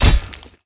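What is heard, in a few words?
pickaxe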